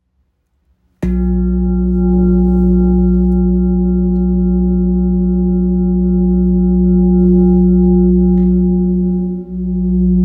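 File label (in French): Bol gros volume fleur de vie fa diese, bol chantant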